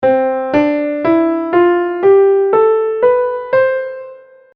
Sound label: C Diatonic Scale